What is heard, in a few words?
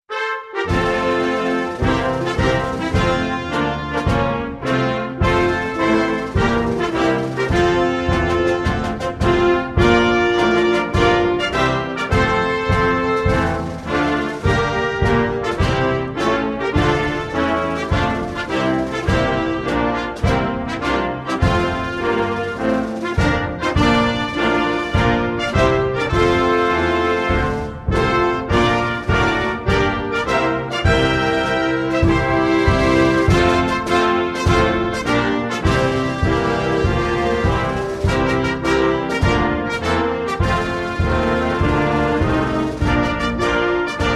anthem